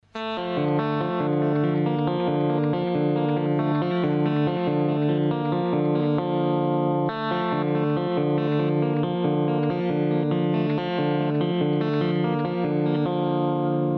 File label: MXR DynaComp Clean